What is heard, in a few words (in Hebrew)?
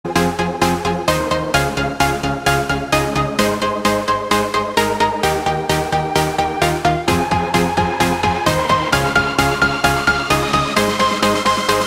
רינגטון